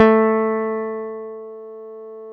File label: A 2 JAZZ GTR; Univers Sons; Jazzistic